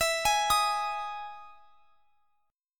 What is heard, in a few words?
E Major 7th E down